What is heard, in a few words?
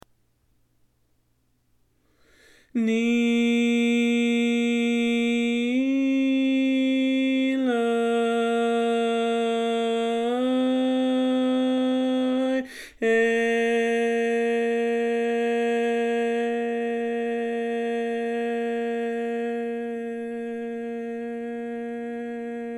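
Baritone, barbershop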